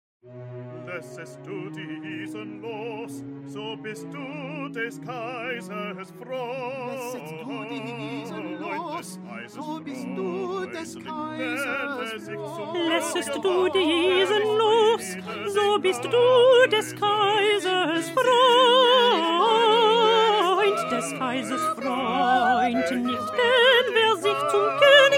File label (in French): petit choeur alti